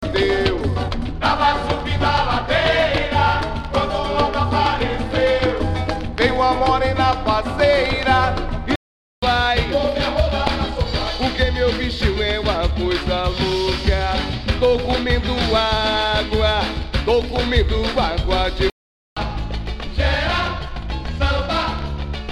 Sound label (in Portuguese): samba; lp vinil